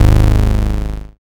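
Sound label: BAS MKS-50, bass, Roland; Sound & Vision - Gigapack II CD 1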